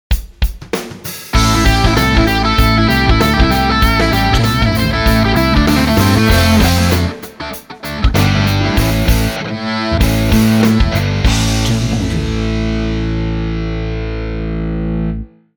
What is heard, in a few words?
Heavy Rock Action Logo